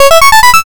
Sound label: oneup